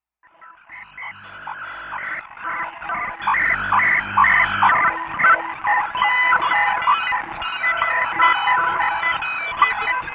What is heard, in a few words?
Digital Grunge LoFi